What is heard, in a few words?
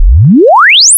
sweep